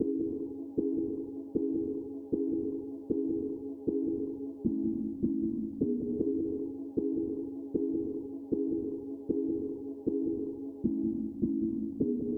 155 BPM C# Min Pluck; Melodies; Loop Stems; Cymatics; 2022 Melody Mystery Expansion; Freezer

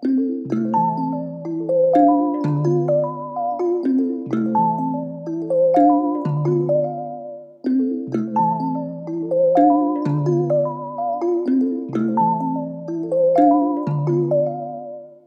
126 A Min Bells; Loop Stems; Cymatics Gems Vol 1; Purple Dreams; Gems Free Teaser Pack

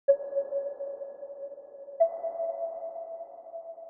125 BPM F# Min Pluck; Loop Stems; Cymatics; Only You

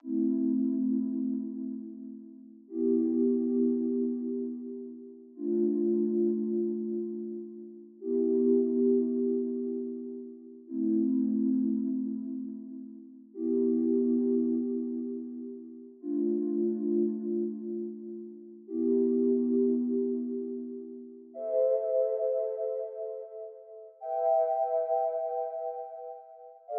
90 BPM A Min Rhodes; Melody; Loop Stems; Cymatics; 2022 Melody Collection Platinum Expansion - Pt 2; Two Sides

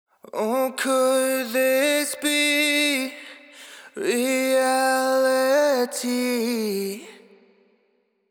Sung Phrase Wet - 128 BPM A Min, Vocal; Reality; Cymatics; Euphoria Vocal Sample Pack

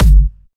Kick, Drums; Bonus Drums

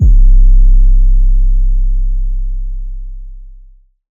808 C; HipHop; 808s; Ghosthack; Advent Calendar

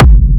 Techno Kick; 30 Techno Kicks 2025; Ghosthack

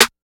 Snare; Modern Drum Kit; Layers

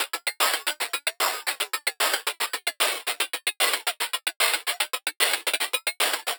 Hi-Hat Loop 150; Dubstep

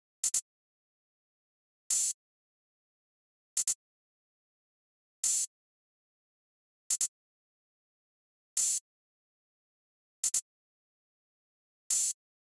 Drum Loop 144 BPM Open Hat, Trap; Cymatics; ANALOG EVOLUTION Beta

